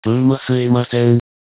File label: death